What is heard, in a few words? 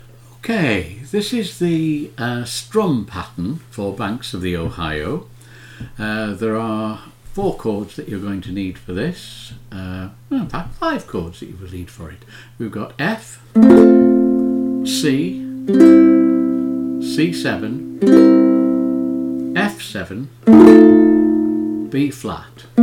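Strum, Ukulele; Banks of the Ohio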